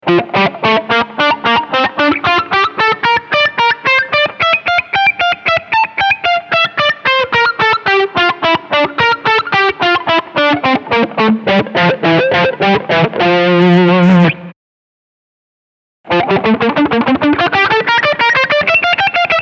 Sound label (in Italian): Fa Staccato